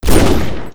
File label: Shotgun Fire; Project Zomboid